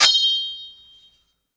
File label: Sword Ching Taken Ou; swords